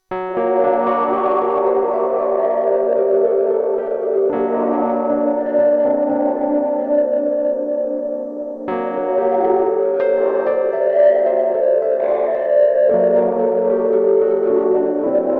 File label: MOD DUO X Piano Tram Quack Shiroverb Mk2 Dark; MOD Devices